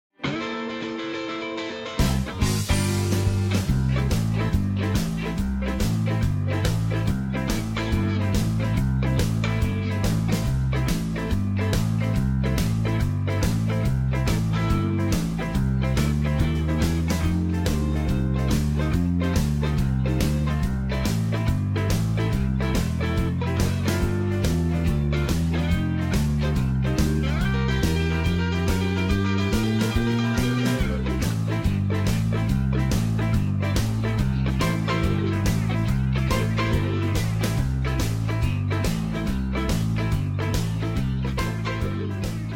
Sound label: C No Backing Vocals